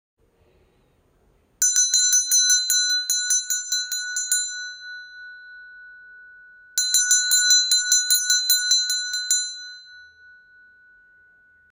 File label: ghanta vajra small gold